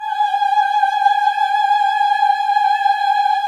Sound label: VIB F G#5, Symphony of Voices, VIBRATO; Spectrasonics